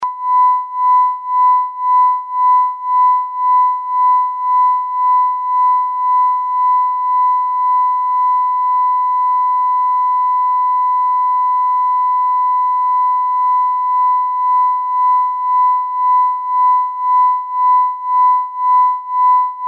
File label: Amplitude Modulation Varying Degree